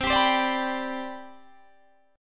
C Major 7th Suspended 2nd C down Guitar Standard, chord; CM7sus2 strummed; JGuitar